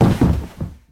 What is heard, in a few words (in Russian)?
korotkij prostoj zvuk vistrela